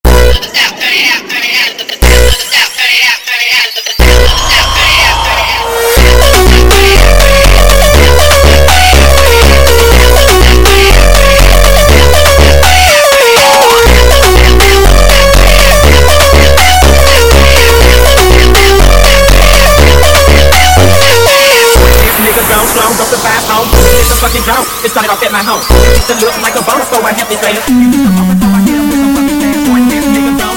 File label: Montagem sped Up